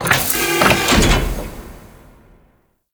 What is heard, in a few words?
Ship Airlock Door Close; Corpus